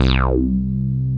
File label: SYN Middle-Reson; Best Service Gigapack 1